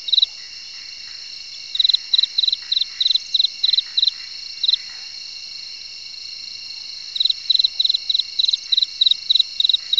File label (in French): Abeille